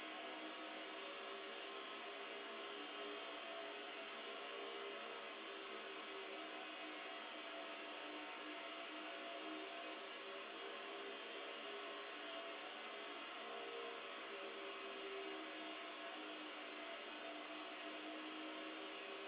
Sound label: line noise canceller on; howling